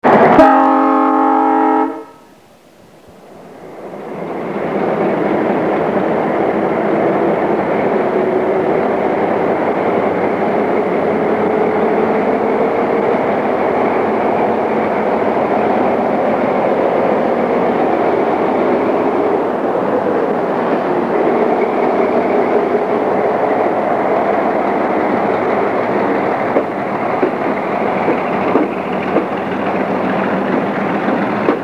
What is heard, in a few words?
Whitcomb twin engined "Superior" diesel leaves Barreiro station; CP 1311